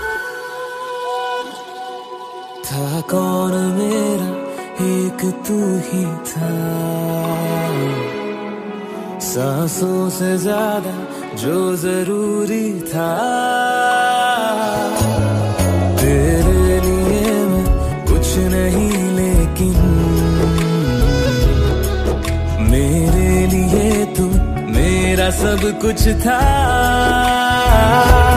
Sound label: sad bollywood song ringtone